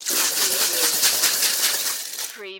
Pesticide Can Shake; Household Sounds / General Household; sound-effects-bible

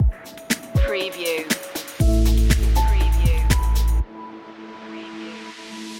calm cool collected stinger

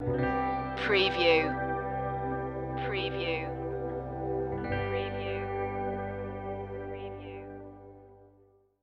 ambient flow stinger

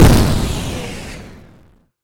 SYNTHESIZED EXPLOSION

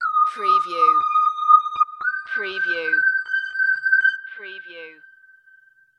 High Evil Tone

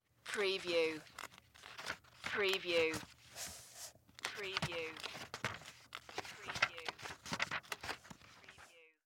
Book Hard Cover Page; sound effects bible

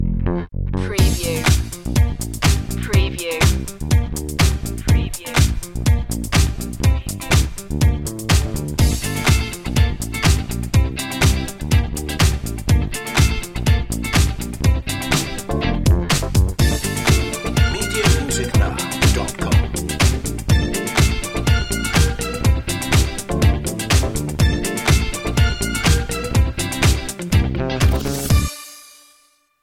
groovy chic

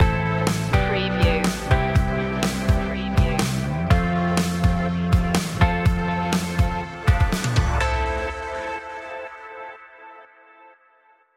brand new day stinger

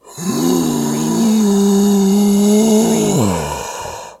Zombie

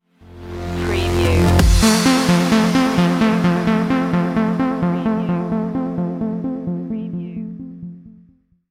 dutch influence stinger

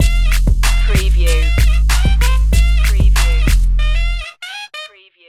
beat the sax stinger

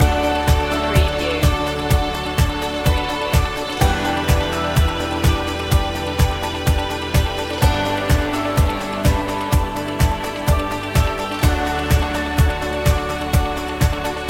vacation road loop

